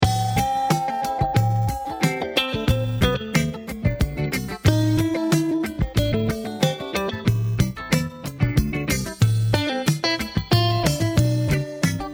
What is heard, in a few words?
loop